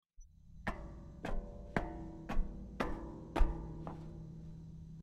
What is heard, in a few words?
footsteps on metal